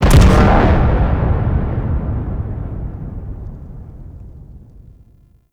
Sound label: Capital Ship Destroy Explosion; Warframe; RailJack; Corpus; Corpus Capital Ships